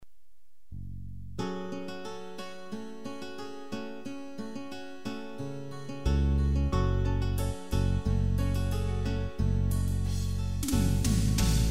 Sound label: korg love 8 beat; ketron sx3000